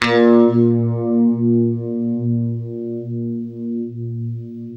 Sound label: UNIVIBE A#1, Guitar; East West; Steve Stevens Guitar Samples Collection